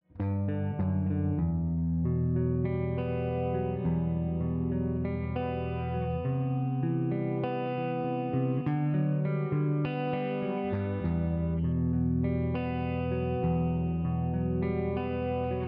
clean, guitar

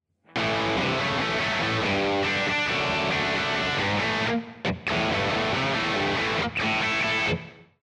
crunch, guitar

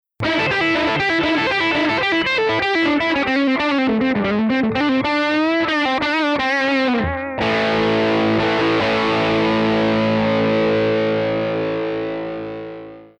dirt fx, guitars

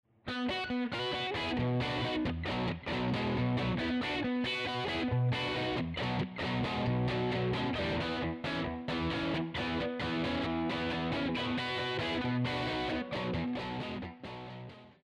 dirt, guitar; LLV 55 EVILTWIN

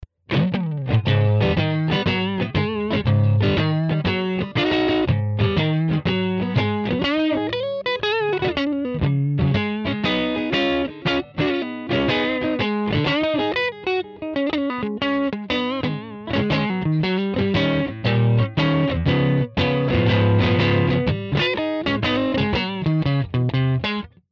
amp, guitars

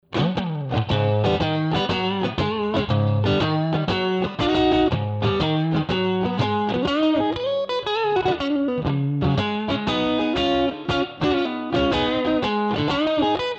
crunch, guitar; LLV-52 PRINCE TWD

